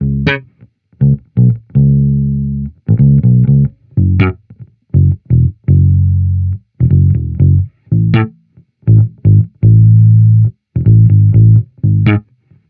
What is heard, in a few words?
Amped loop Slapper 122 C#, Electric Bass Loops; Slate Digital BASSHOLE Sample Pack